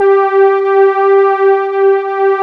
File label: SOFTPAD G4; Big Fish Audio; Synth City